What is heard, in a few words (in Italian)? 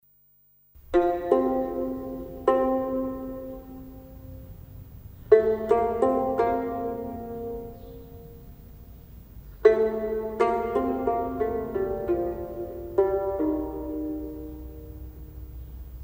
Musica greca - Epitaffio di Sicilo